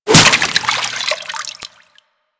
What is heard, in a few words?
Infested Whip Impact Water